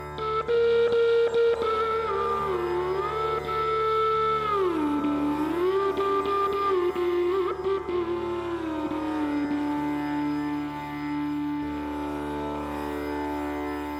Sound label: Ringtone